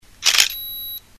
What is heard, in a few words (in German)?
ausloesegeraeusch, nistkasten